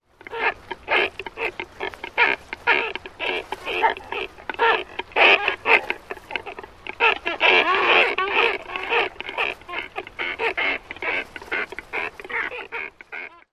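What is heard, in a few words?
Great Cormorant dispute calls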